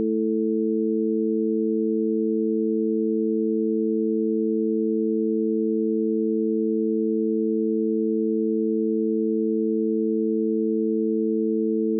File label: music bed; music ducking